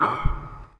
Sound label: Pulp; spider eater; toy zombies